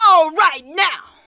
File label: VOX VOX; Sound & Vision - Gigapack II CD 2